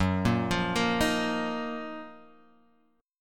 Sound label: Gb Major 13th, down, Guitar, Drop D